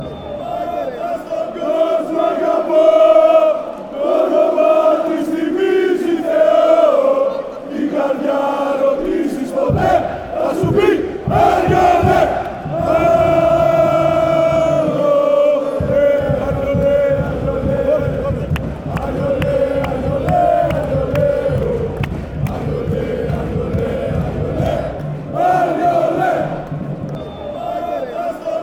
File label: chant